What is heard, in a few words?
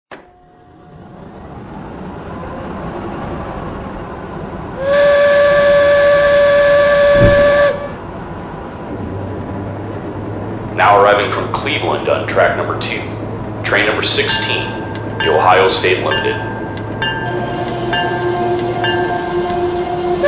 Box Cab; P2; NY